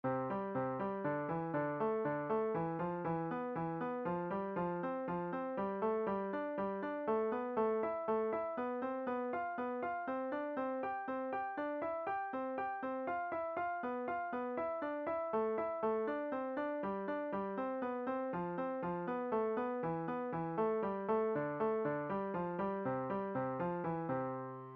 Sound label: Five finger Intervals Fifth, piano